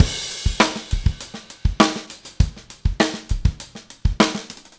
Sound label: Funkified, Rock Drums